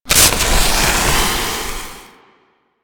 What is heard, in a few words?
Proto Infested Maggot Spark Start